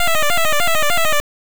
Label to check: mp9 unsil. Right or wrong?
Wrong